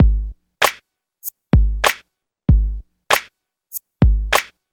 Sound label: 96.5 bpm gangsta, drum loop